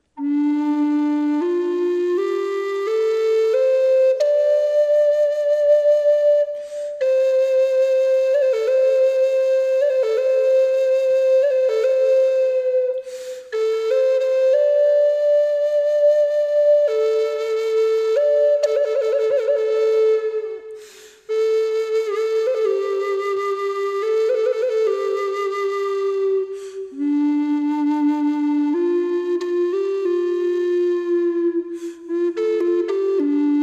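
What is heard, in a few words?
Cedar Classic, flute, woodsounds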